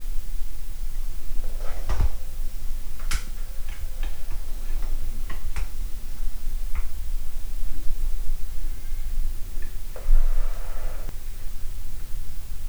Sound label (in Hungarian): tigrispiton nyelés közben tett mozdulat és nyelés; állathangok; miskolci zoo 2009